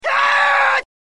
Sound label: Fight